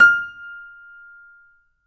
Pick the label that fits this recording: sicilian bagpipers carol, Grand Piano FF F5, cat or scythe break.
Grand Piano FF F5